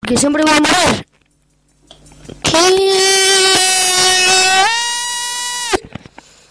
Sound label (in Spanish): GRITO PRIMAL